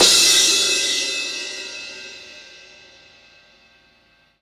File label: CYMBAL, CRASH; Sonic Foundry (Sony Creative Software); Drum Tools; Laidback